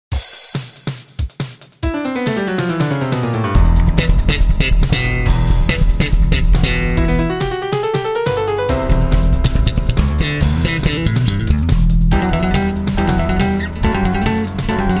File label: bass bass